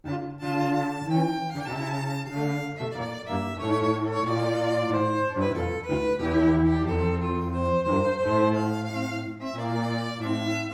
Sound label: trio; pezel